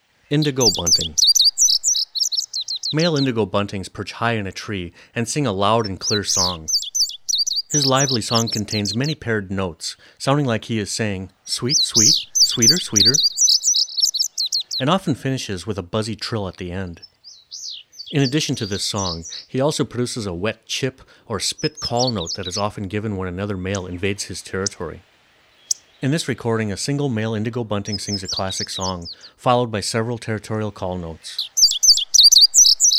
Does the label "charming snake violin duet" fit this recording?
No